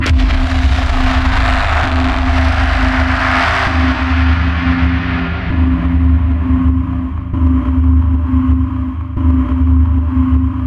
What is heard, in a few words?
Stinger Boss; Curved Space; Switch gamerip